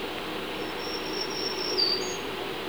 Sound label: Black-Throated Green Warbler, Hemlock Canopy; Delaware Water Gap, Upper Pioneer Trail - Hemlock Canopy; birdsongs